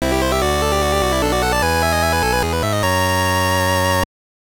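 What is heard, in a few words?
Jingle, Game Boy Color; Chee-Chai Alien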